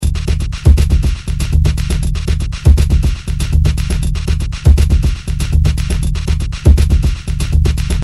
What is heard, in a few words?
Batucada; OpenSounds Evangelos